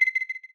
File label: ping alert, ui; Counter Strike Model Pack; CS:GO; panorama